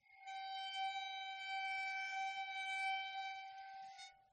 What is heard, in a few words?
waterphone long bows dynamic; aston stealth